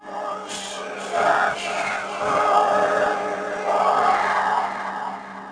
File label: sound test